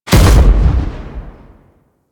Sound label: Army Proj Explosion; Warframe; Warframes; Hoplite